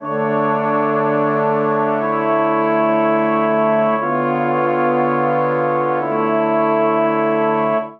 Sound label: Phrase Suspicious D Minor 120 bpm, Brass Quartet; MIDI Clips; Ableton; Spitfire Audio